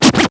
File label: scratch Cmb, Yamaha Motif ES; Yamaha Motif ES-Series